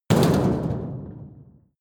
metal impact sheet rumble rattle medium; hits explosionen; metall

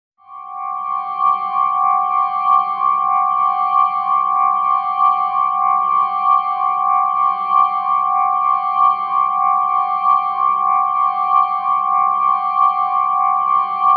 organ pad atonal; flaechen

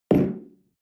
espresso place tablecloth slide, restaurant cafe

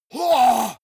monster growl attack; schreie

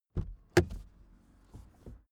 suv open door soft

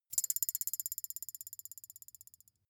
thin metal boing vibrate

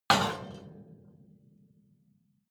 metal impact iron ring rattle

